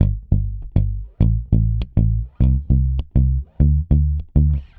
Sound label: Mute Rhythm, Bass; Bass X